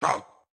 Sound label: Chop Attack; Caffiend Caelan